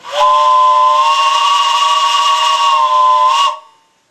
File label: Whist